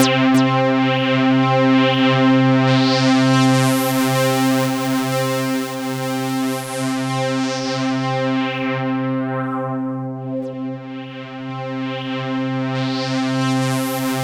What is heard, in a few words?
C3, SynthLine